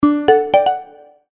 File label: Folder Opened; business scheme beta 2